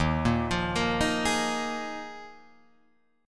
D sharp Suspended 2nd Suspended 4th D sharp Guitar Drop D